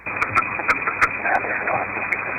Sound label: whispers; Electronic Voice Phenomenon; A Whispering voice saying "Yes it is".